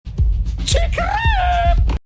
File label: scratch